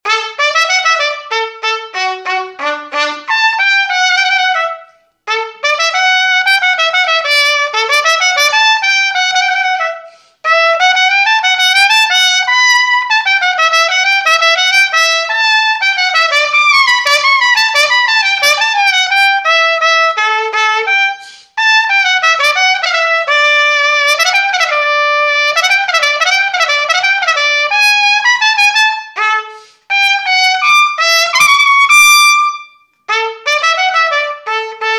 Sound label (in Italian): Tromba